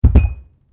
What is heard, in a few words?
Weights Dumbell Put Down; Gym weight lifting equipment; Sports